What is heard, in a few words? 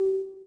BELL; Real War: Air Land Sea